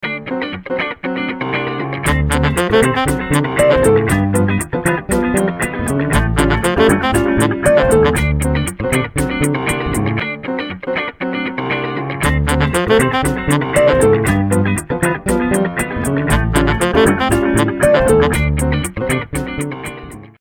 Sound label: Joyful Jazz Funk, alarms; Rollable LM R910N; LG